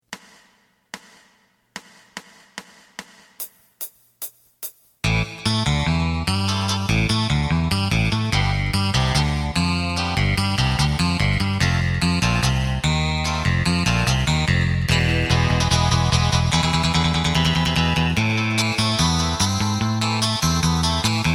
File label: drum